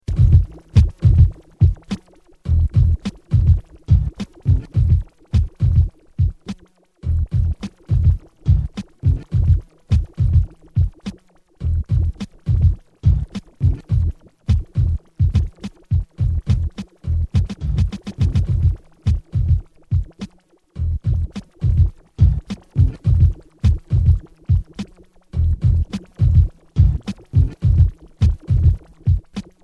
bass drum beat

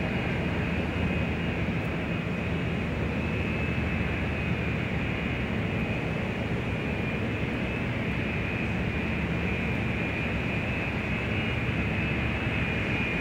Large Airport General Exterior

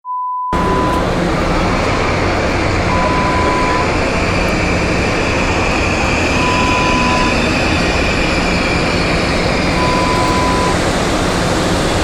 Station ambience sound

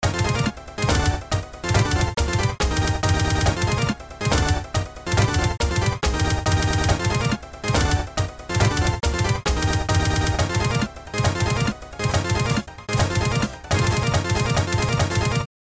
simple groove

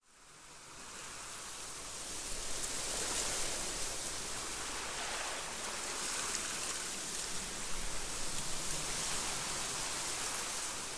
Tropical Beach

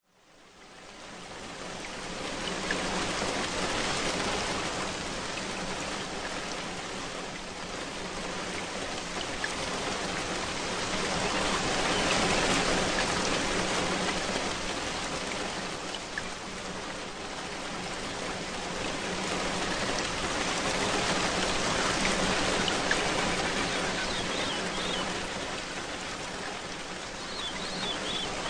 Torrential Rain

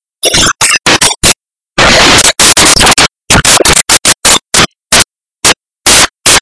Airport Terminal Building Footst

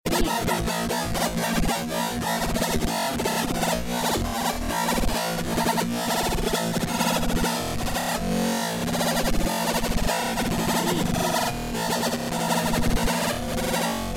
Space Infra Buzz

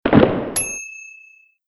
shotgun

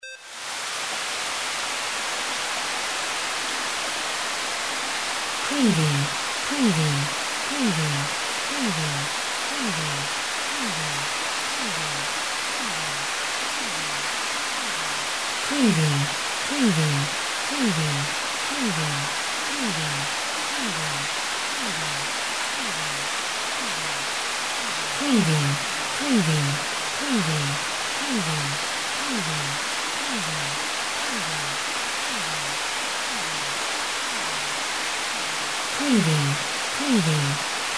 RAIN CITY

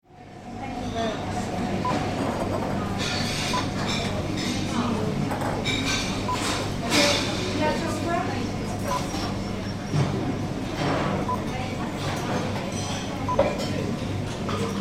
Cafe by counter

